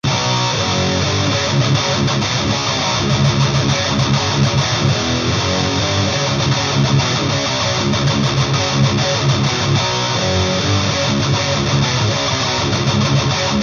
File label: guitar sample, 1x12; krankenstein